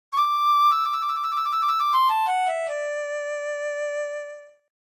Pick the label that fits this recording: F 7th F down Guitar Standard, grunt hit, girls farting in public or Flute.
Flute